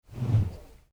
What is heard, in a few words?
hollowcore whoosh slow